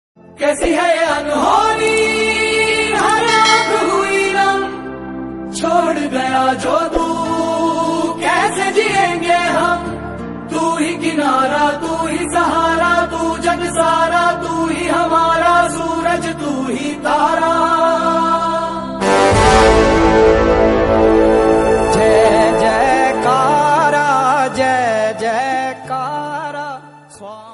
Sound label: bgm; bahubali 2